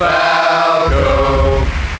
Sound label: Cheer International; SSBM